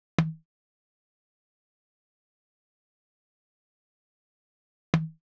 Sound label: Windy Drum Loop - 101 BPM Percussion; Loop Stems; Cymatics; Vibes II Drum Loop Collection